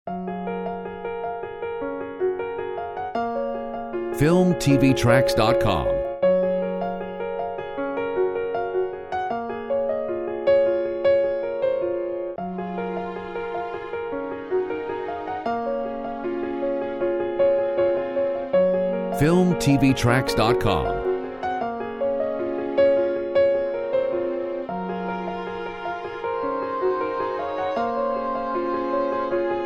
Enchanted Piano

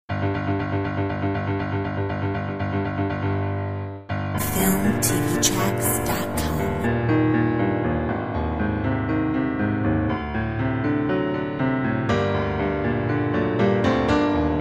Orchestral avant garde; Filmtv-tracks

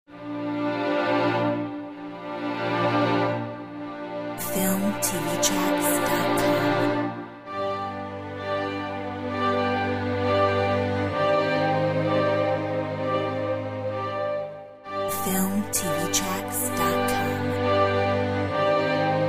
Requiem; Filmtv-tracks

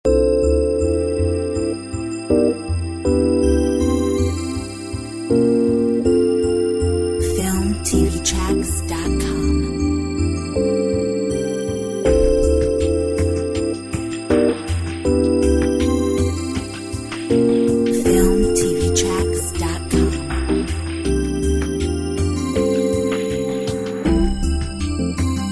Jazzy Jingle Bells

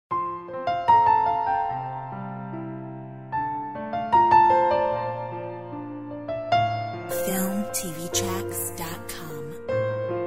Orch

